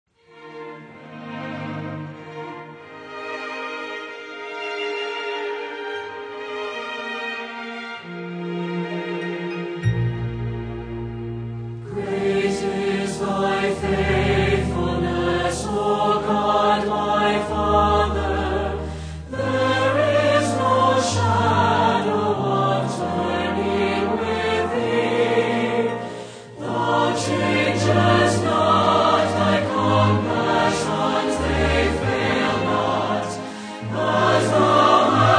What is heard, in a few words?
Singers And Orchestra